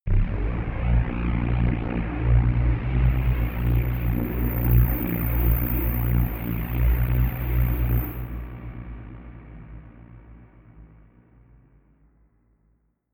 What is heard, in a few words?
dark rumble cricket, drones; musikelemente